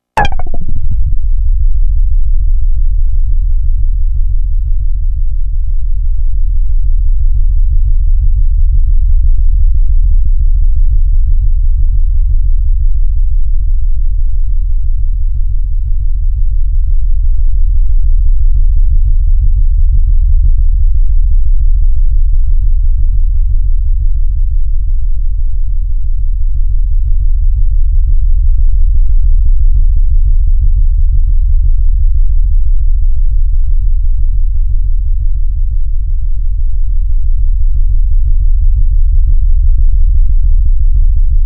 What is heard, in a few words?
Huge Booming Fatty Bass Drone; Best Of N2O Library; Drones & Foundations